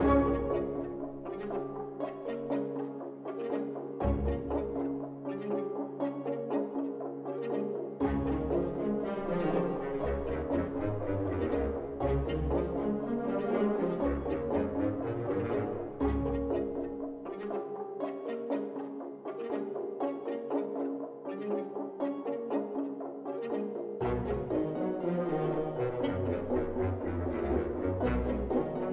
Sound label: Music Dialogue Neutral 60bpm Loop